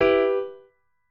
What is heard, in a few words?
piano